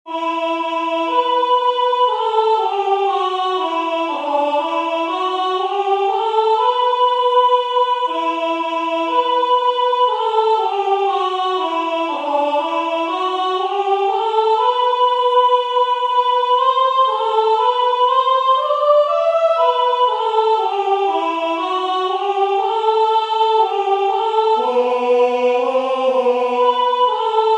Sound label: Soprano; God Rest